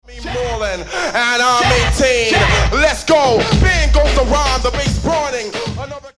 Vocals ('let's go!')

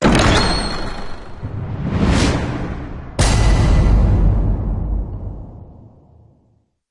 sfx court begins

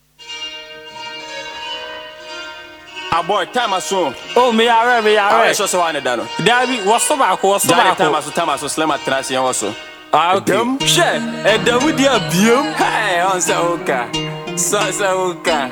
Beat

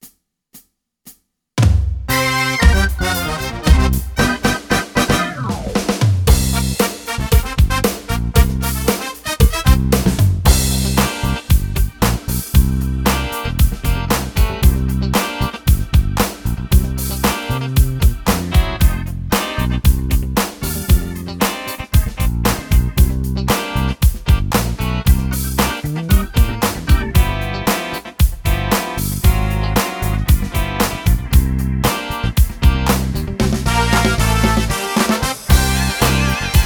blues

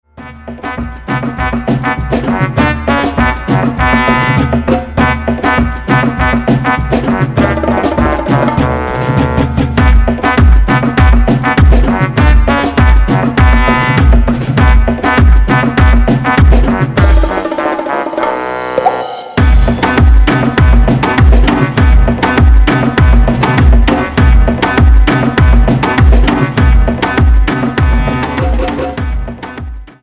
SAMBA - Samora (Samba) (50BPM)

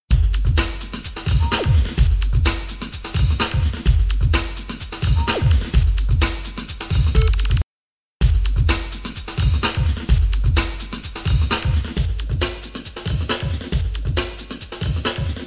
Beat

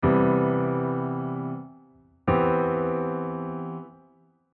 C maj7 in 5ths

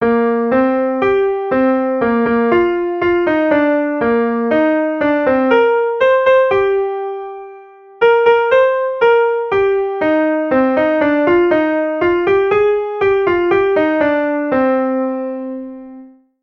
piano; The Moon Shone Bright; Piano recording (computer generated – a little fast, sorry)

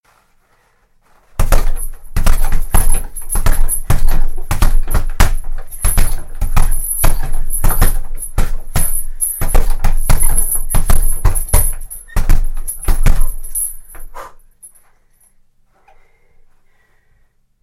bag

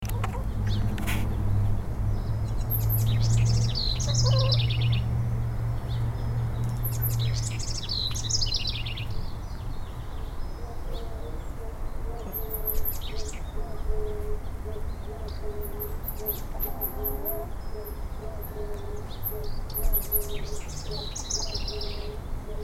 wren

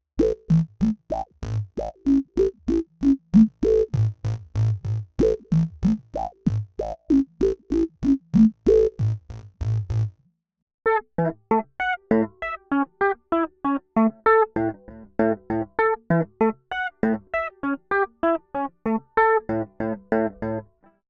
synth

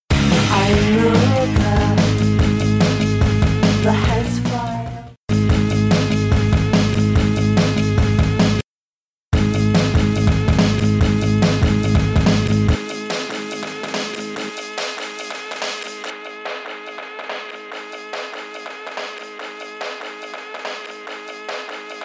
Guitar riffs & drums

